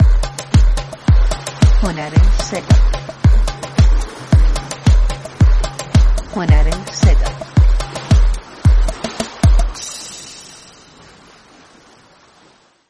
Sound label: persian groove